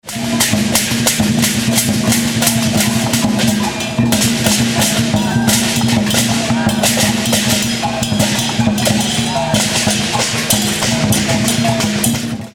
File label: street percussion bangkok; music elements; grooves